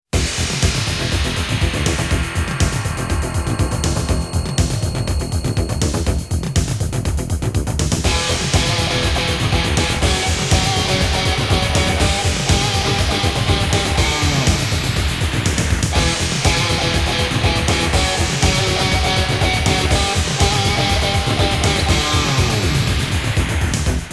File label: minus lead guitar